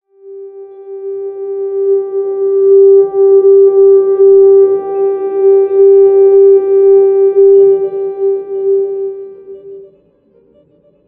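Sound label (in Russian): мистическая атмосфера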